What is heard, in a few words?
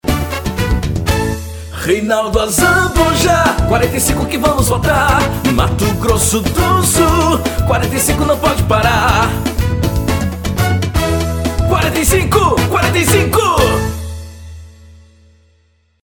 Jingle; Reinaldo Azambuja